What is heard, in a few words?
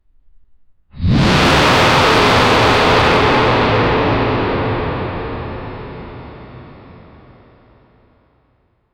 a roar kind of like, kaiju